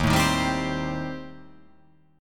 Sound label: D sharp 6th Flat 5th, D sharp, down; strummed; JGuitar